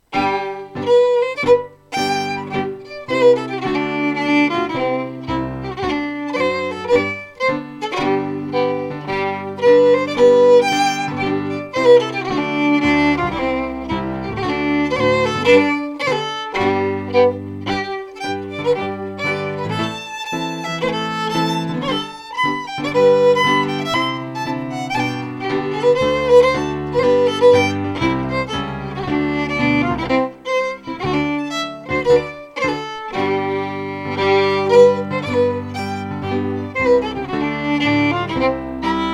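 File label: Strathspey, Scottish Fiddling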